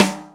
sfx perc

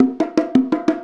Bongo; Essential Percussion

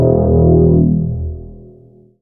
Bass (low metal) (E); Sonic Foundry (Sony Creative Software); Ugly Remnants VOL-2; Basstuff

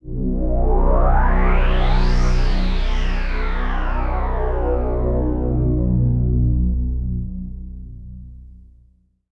Analog Echo Swell; SFX & One-Shots

